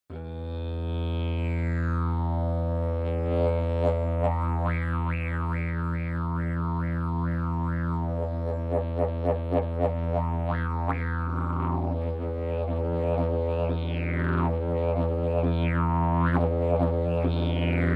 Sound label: didgeridoo; jilm lesni; 155 x 10 x 4 cm; teakový olej; Ladění : E; Tomáš Dufek